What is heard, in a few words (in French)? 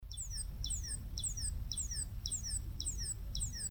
Taupe sonore